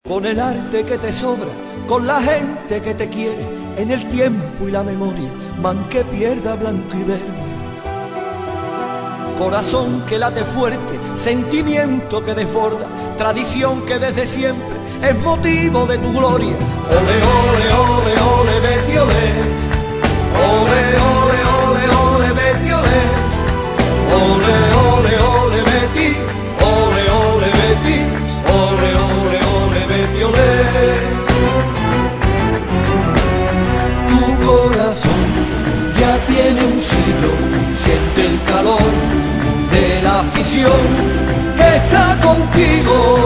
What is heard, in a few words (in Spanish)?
himno; betis balompie